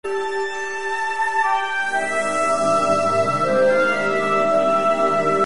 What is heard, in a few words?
melody